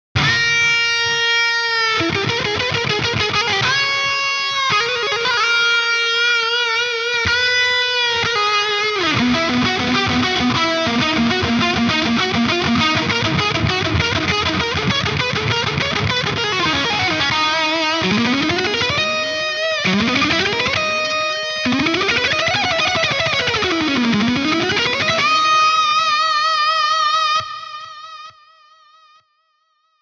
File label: OVERDRIVE LEAD Rev Delay; SaturnLO